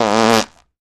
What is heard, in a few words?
Fart Loud Close